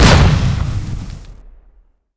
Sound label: flashbang explode